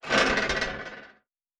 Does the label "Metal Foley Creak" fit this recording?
Yes